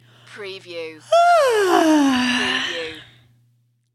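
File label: Female Yawn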